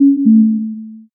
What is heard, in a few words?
error